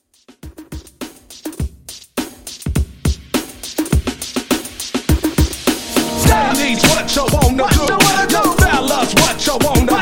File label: 90s RnB Redrum 103 Bpm